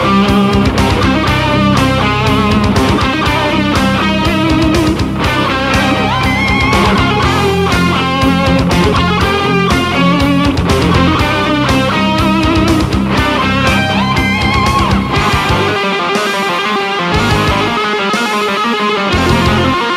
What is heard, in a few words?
metal; X men theme